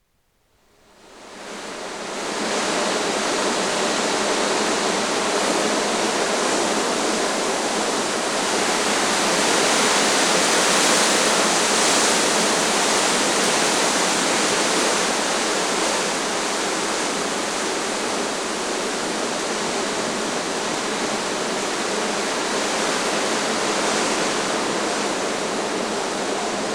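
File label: strong wind in the forest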